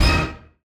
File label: funny orchestra hit (d#)